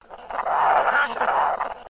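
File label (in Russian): zvuki bronenostsa, armadillo sounds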